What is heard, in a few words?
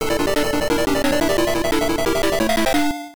All Clear; Castlevania; Akumajo Dracula; Tandy 1000